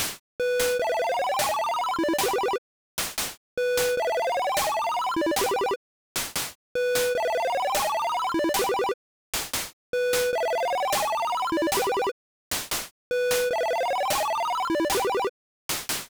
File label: level up